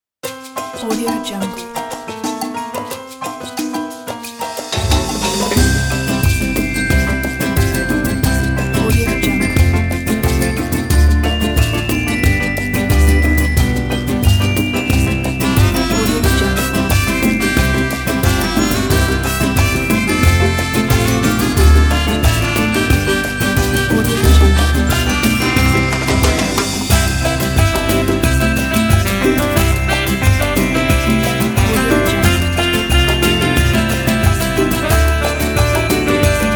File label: jingle bells latino